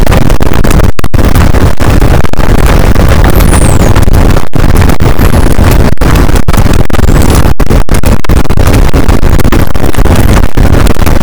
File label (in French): cachalot positif, boat; Physeter Macrocephalus